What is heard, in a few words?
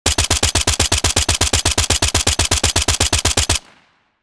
MK2 assault rifle full auto fire; war; gfxsounds